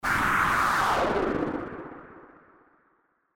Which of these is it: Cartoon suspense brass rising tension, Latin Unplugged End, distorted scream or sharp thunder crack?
distorted scream